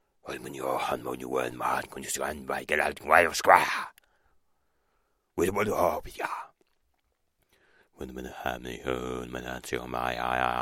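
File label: shaman sounds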